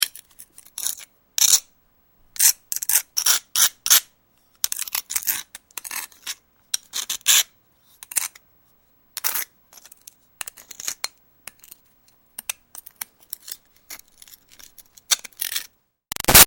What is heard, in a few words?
Foley Rock Scraping Archaeology Moves; Materials